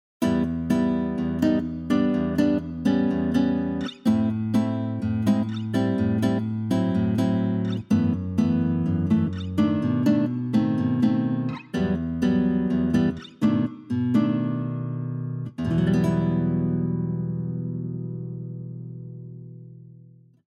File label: guitar